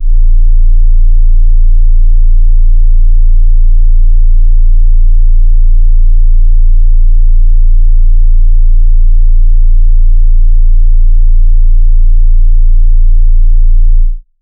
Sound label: overlapping 960 hz, frequency